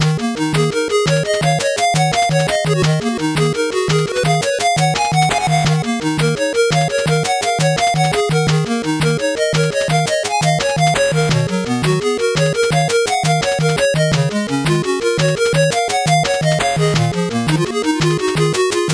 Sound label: 8bit bgm slow